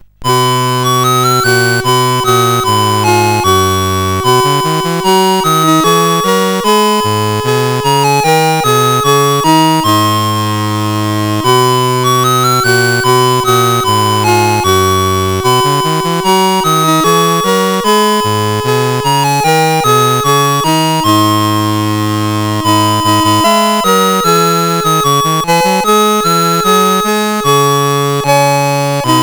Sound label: Intrada, CSG8580R5